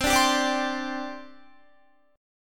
C Suspended 2nd, C, down, Guitar; Csus2; strummed

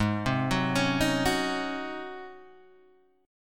G sharp 11th G sharp, down, Guitar, Open G

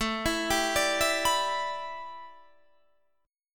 A 7th Suspended 2nd Suspended 4th; A7sus2sus4; arpeggiated

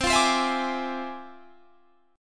F Minor Major 13th, C

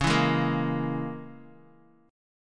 Db Minor 6th Db down Guitar Open D

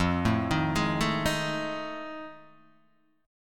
F 7th Sharp 9th, Guitar, Open D

